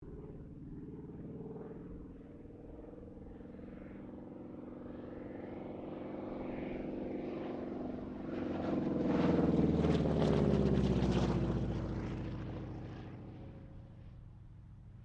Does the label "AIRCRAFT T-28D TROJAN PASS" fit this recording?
Yes